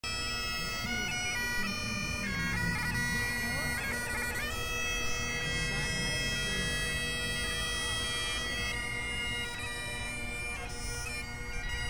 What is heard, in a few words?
Scottish Piping